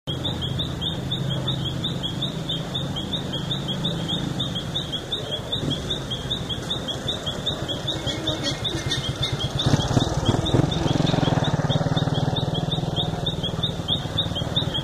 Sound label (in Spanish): calles; campbell y galves